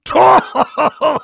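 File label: Heavy laugh short, German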